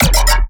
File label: bass hit, Bass Stabs; Virtual Riot